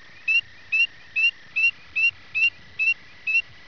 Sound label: p. crucifer, frogs